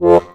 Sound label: DIATON BAS, AKKORDEON, Alpine Volksmusik; Best Service